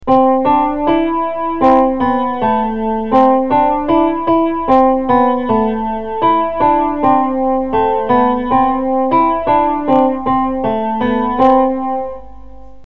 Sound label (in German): melodie